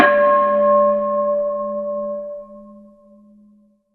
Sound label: Bell; Warframe; Dojo Props; Bells